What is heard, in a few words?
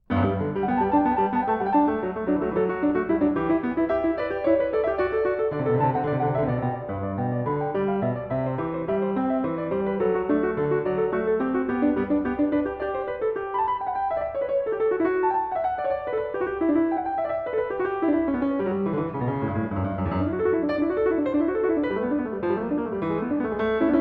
Goldberg Variations, Variation 14 a 2 Clav.; Bach; Zenph Re-performance; BWV 988